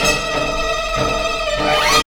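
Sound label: string run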